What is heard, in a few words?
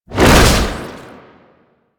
Underground Attack Swing; Enemies; Abilities; Warframe; Proto Infested; Babau